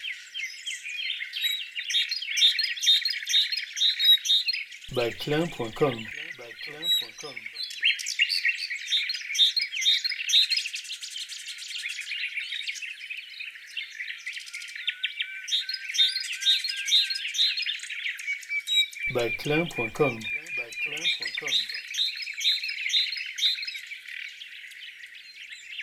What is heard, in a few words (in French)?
oiseaux